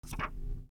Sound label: flip sound